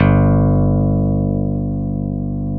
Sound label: CP70; USB Soundscan vol.09; AKAI; Keyboards Old School